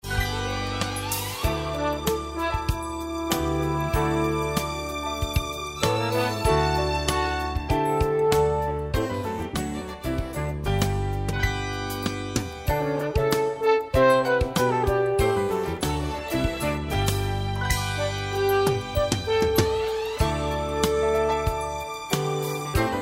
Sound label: Promo Bed